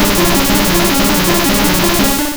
Psybeam Direct; Pokemon; Gen 1; attack moves; RBY

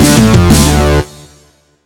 Death Jingle; Astro Rangers; PS4

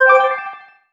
success smooth

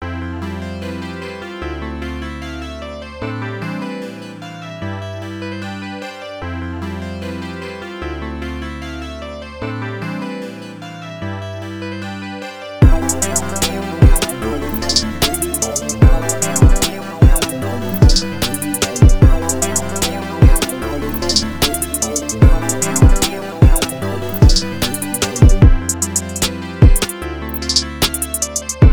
Instrumental

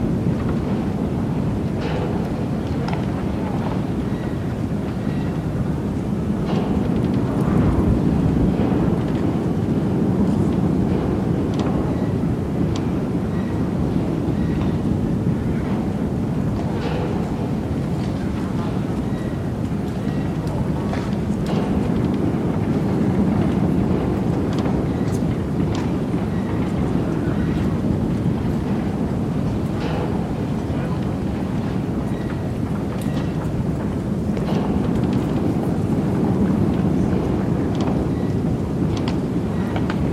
Wind town

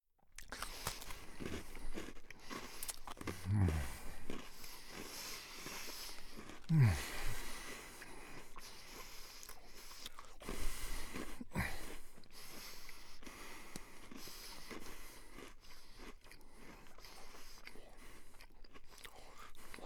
Foley Eat Biscuits and drink; The 39 Steps

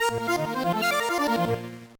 Carnival Minigame Success; the urbz sims in the city; gba

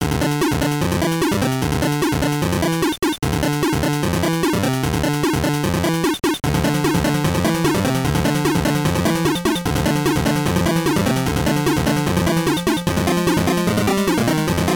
BGM; thunderbirds; 2000 gbc

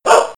Hit Bear

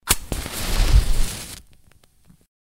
light match strike and light

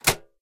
Slot; Angry Birds

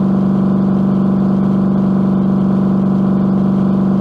Engine Muscle Rpm Low; multiplayer kart racing; boom karts; android

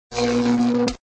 Select Lightning

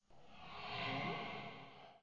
breath; fredbear

